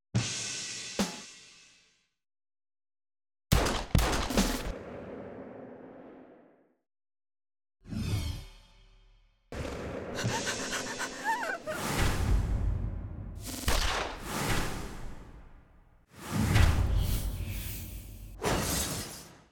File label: FX Stereo; Clean Cuts